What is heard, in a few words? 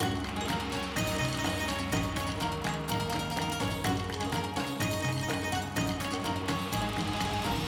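background music Super Wheel; Wealth Gods2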